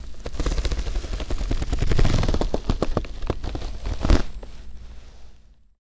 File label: Denim Jean Zipper; zippers